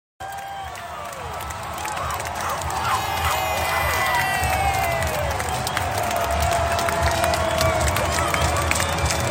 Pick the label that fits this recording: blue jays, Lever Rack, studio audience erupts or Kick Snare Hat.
blue jays